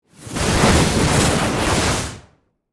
Magic Spell Build, Horror, Magic, Spell, Build, Up